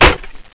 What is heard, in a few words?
impact wood; valve